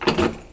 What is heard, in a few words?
open